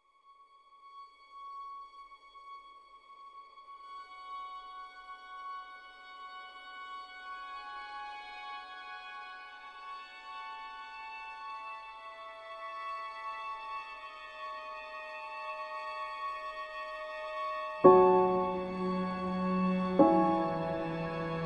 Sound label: Emotional Melancholy Background